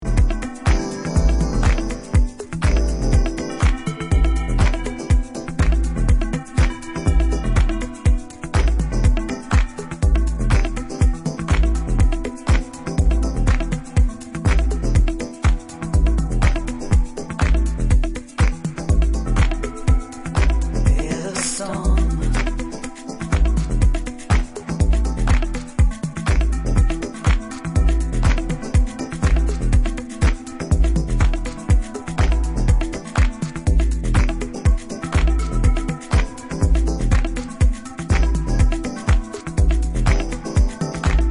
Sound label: storm (instrumental)